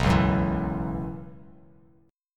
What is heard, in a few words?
B Suspended 2nd Suspended 4th, Bass Standard; strummed; JGuitar